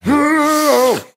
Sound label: "huhoh" sung; ooh ahh; heh huh